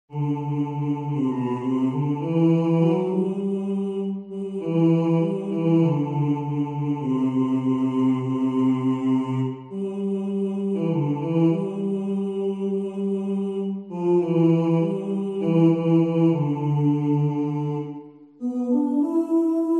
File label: i dreamed of rain soprano, choir